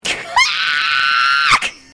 screech

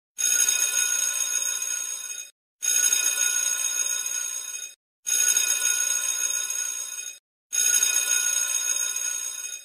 bell

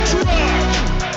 truck; asgore

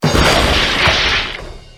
broken wood sound

